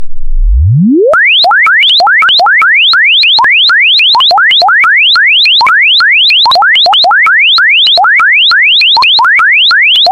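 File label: loud message alert ringtone, sms ringtones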